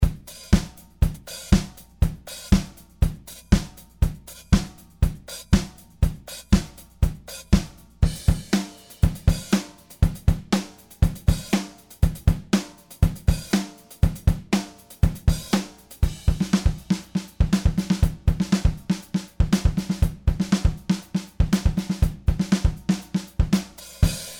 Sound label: kit styles; groove agent 05